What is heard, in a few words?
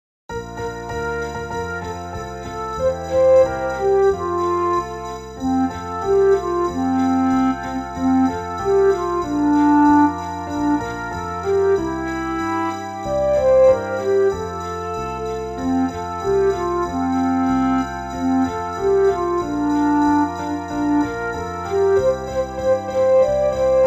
Jingle Bells Karaoke